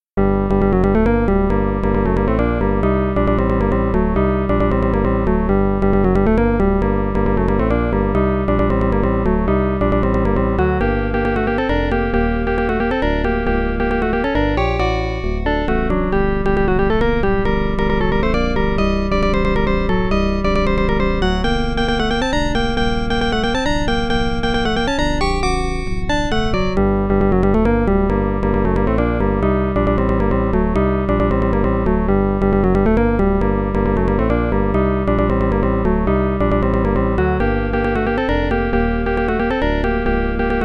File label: Combat